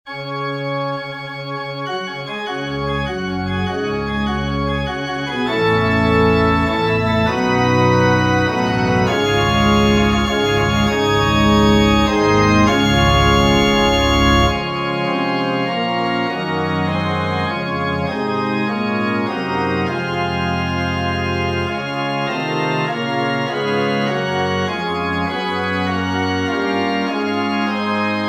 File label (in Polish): ORGANY Ciebie Boze wielbimy